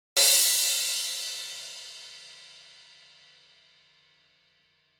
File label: Crash